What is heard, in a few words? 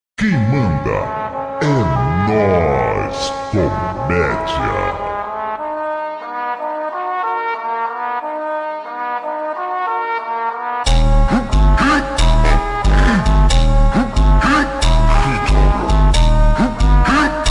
Funk super slowed reverb